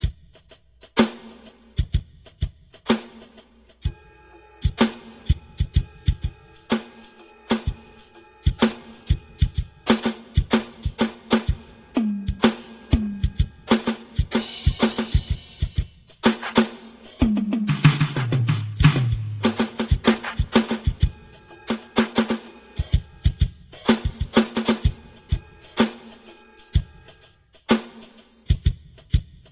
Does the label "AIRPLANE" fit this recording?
No